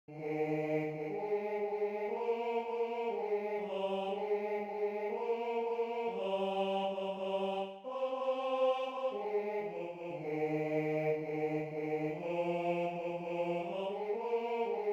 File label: tenor; Frihet är det bästa ting